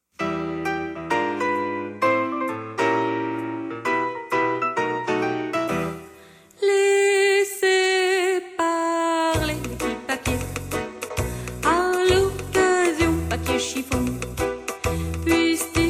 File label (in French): chorale